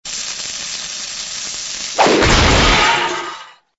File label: dynamite